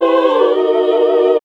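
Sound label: classical singing; Sound & Vision - Gigapack II CD 1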